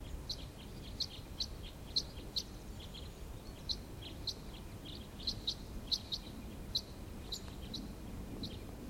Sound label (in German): fichtenkreuzschnabel